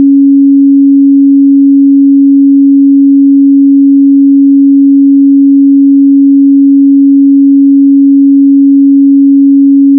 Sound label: Tone C#